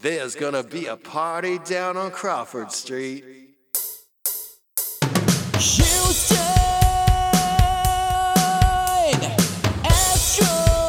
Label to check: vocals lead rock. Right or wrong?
Right